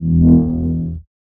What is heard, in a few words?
lightsword fast swing; soundscrate